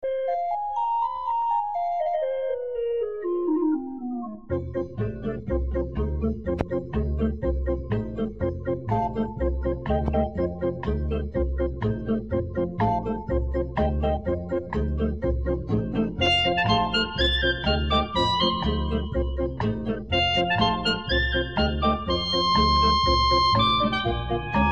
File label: Ringtone